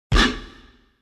puff; lego

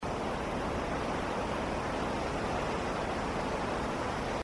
wind; knex; makeTheConnection

